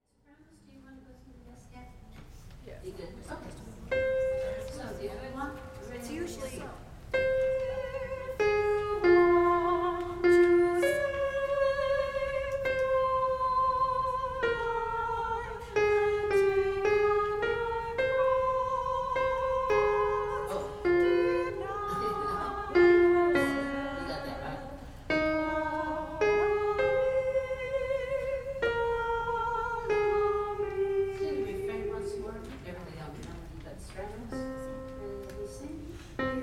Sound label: Take Up Your Cross - descant